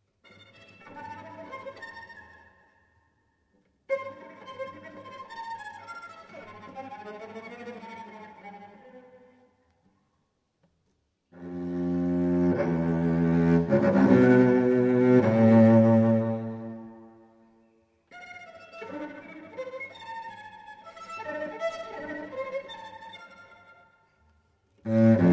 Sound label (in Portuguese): para violoncelo solo; Solilóquio II para violoncelo solo (2000); Harry Crowl